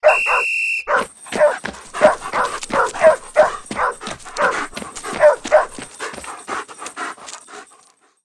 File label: Guard Start Run Stumble; Guard Start Run Stumble Base; Subway Surfers City; Android, iOS